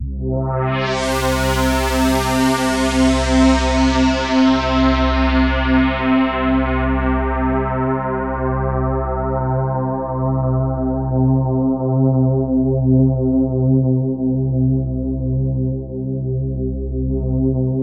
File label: mothership sweep c2; Nucleus Soundlab; Viral Outbreak